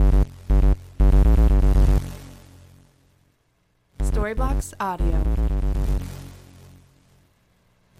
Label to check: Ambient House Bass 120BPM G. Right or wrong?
Right